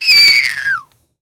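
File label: screeching firework; circus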